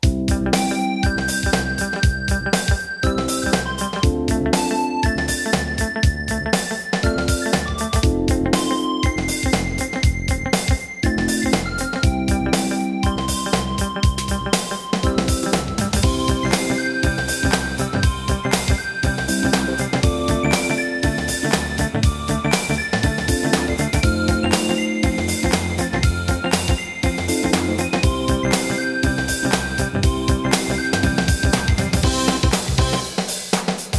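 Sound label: HipHop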